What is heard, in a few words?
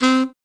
Notes C Sax; Scratch game maker software